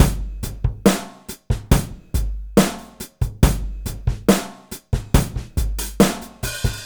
Slomo Beat 140 BPM, Loops, Slow Motion Beats

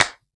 Claps; Vengeance Samples

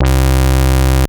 Synth Bass; Vengeance Samples; VEE Synth Shots

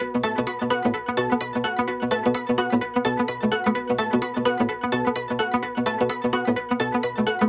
Melody 3, 128BPM; Kinto Melody 3

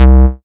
Bass C, Offbeat Bass

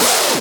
Synths E; Vengeance Samples

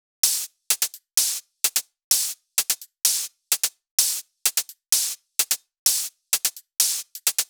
128BPM Emote Kit; Vengeance Samples

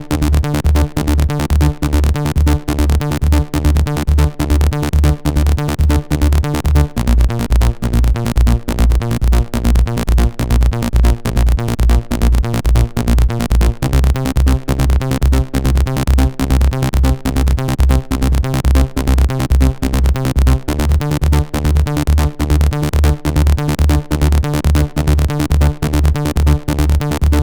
Bassline WET 140BPM, Dminor; Vengeance Samples

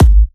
Kick, 128BPM; Pee Dee Kick

